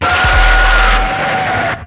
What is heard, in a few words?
swirl noize; Weird and Interesting Hits; single hits